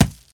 Fruit Collision

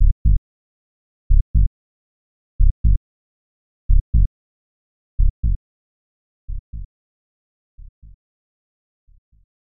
Heartbeat; benkei gaiden; snes

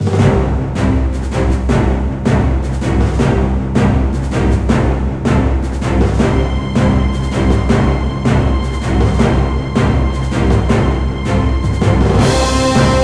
BGM Battle; Cookie Run Kingdom

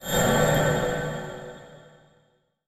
UI Continue

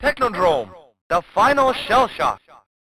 Level Intro; Technodrome ~ The Final Shell Shock; Teenage Mutant Ninja Turtles IV Turtles in Time

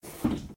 Train door Open Close

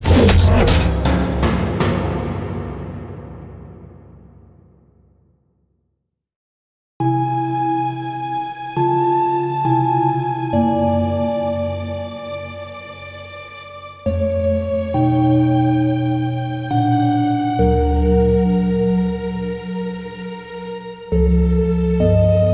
Failed; Minecraft Dungeons Seasonal Adventures Original Game Soundtrack 2023

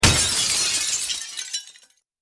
Break Glass Sound; Granny 3; Android

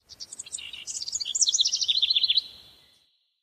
Amb Birdvillage Birds

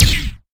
Menu Confirm

